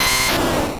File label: cry